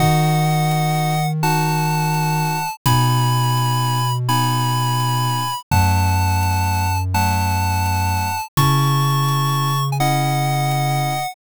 bgm mellow

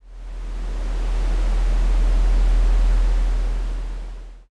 planet ambient

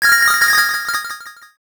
coin shower

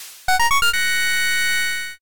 all clear

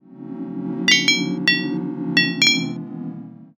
tavern

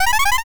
synth voice

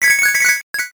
coin shower

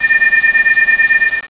forcefield

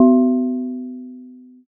gong

